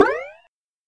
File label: jump